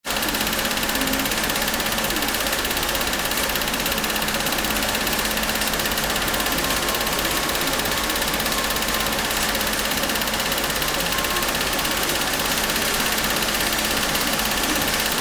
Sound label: winding machine